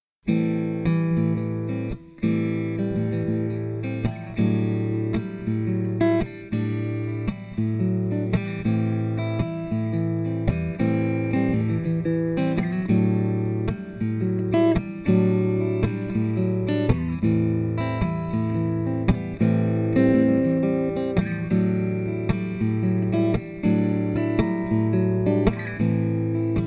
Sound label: Am112 GTR impro